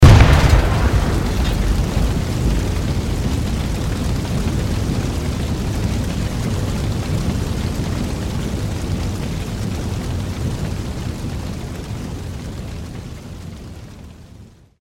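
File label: explosion and burning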